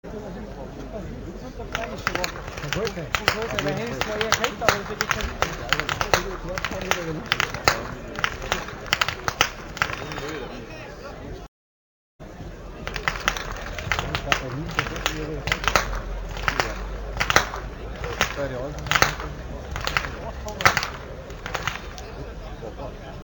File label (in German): schnalzen, jugend; anthering